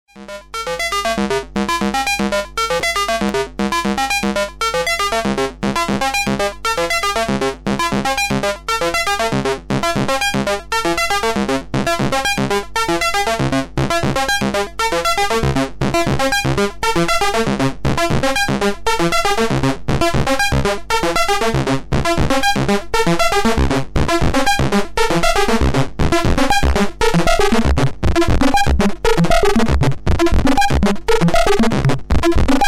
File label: single tri